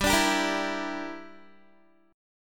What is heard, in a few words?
G Minor 6th, Guitar; Gm6 strummed; 15,17,17,15,16,x